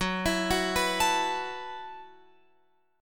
F sharp Minor 11th, Guitar, Open F; F#m11 arpeggiated

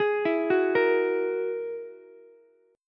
Ab 7th Suspended 2nd Sharp 5th, Ukulele; Ab7sus2#5 arpeggiated

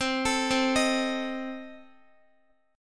C Minor 6th Guitar, chord; Cm6 arpeggiated; x,x,12,14,12,11

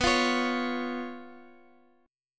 B Suspended 2nd Flat 5th down Guitar; strummed; JGuitar

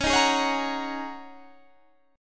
G sharp 9th Suspended 4th C sharp

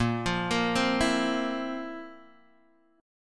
Bb Suspended 2nd Sharp 11th, chord; Bbsus2#11 chord; arpeggiated; JGuitar

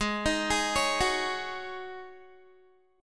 Ab 7th Suspended 4th Ab down Guitar; Ab7sus4 arpeggiated

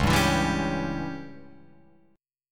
Db Diminished Db down Guitar Open F; strummed; Dbdim chord; JGuitar

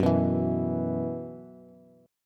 Gb 7th Suspended 4th Gb down Bass, chord; Gb7sus4; strummed; JGuitar

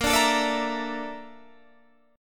Bb Major 7th Flat 5th Bb Guitar Standard; BbM7b5 strummed; JGuitar

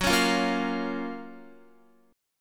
Gb Major 7th Gb down Guitar; GbM7 strummed; JGuitar

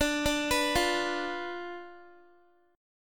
D Minor 6th Guitar; Dm6 arpeggiated

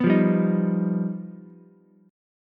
G Augmented 7th, Ukulele; G+7 strummed; 4,5,3,6; JGuitar

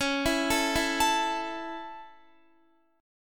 Db Minor Sharp 5th Db down Guitar; Dbm#5; arpeggiated; tuning=4E,4A,5D,5G,5C,6F

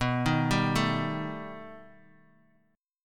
B Augmented 7th B down Bass Standard; arpeggiated; JGuitar

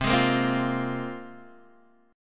C 7th Suspended 4th Sharp 5th, Guitar, Open D

